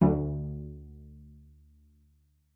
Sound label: cello pizz D2; 90 s Sample CDs; Xsample VOL-5